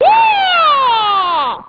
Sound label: kick; liu kang; AQ2